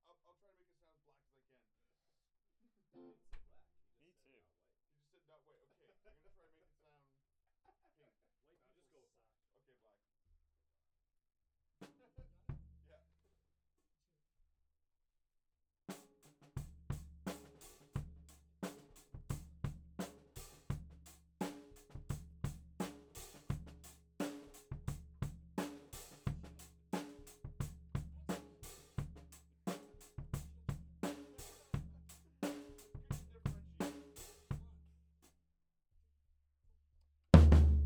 Floor

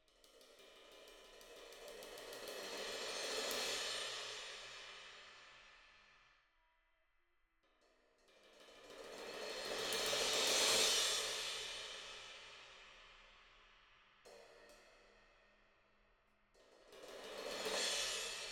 Cymbal

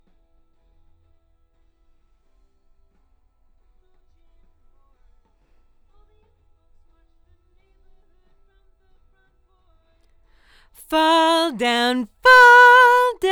Ld VOX Double

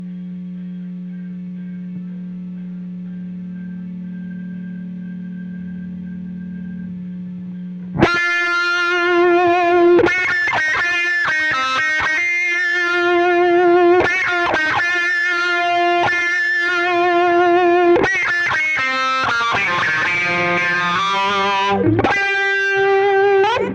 Solo Gtr

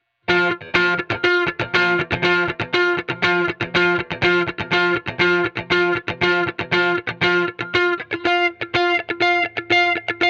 Gtr

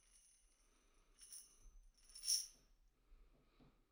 Tamb

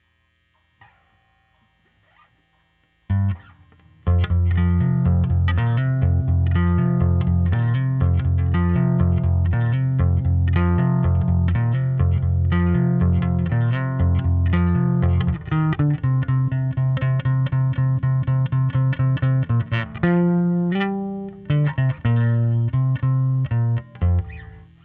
Bass OD

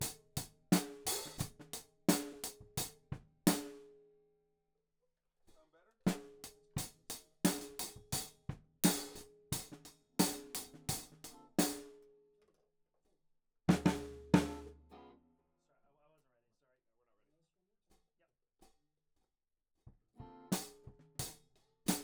Hat

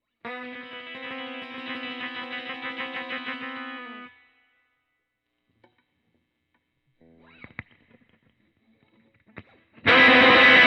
Gtr Solo

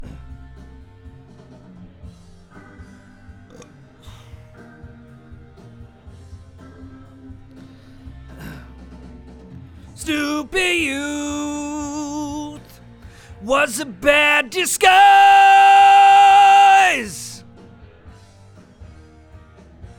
VOX

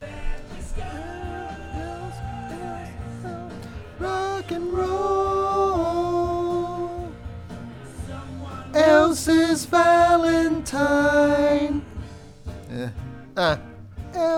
VOX Back

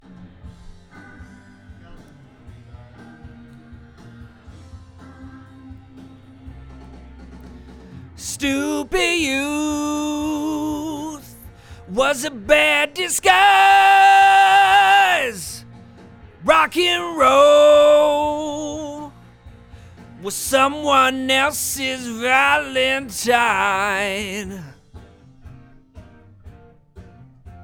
VOX

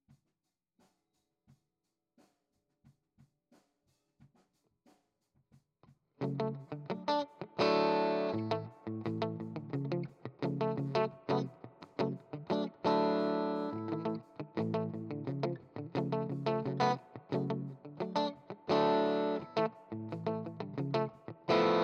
GTR

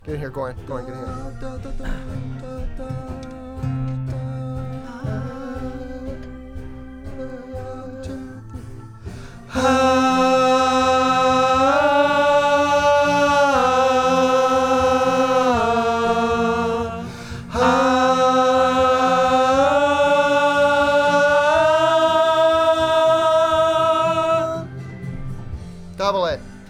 VOX Double

